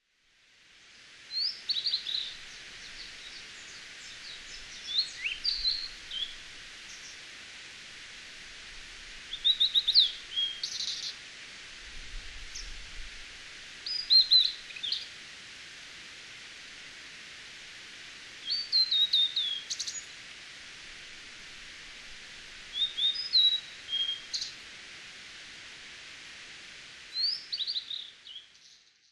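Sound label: blue-and-white flycatcher, tanzawa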